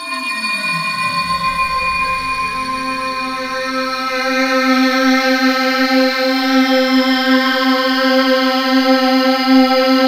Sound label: STARTREKVOX, ROLAND D-50; Rubber Chicken Software Sample Bank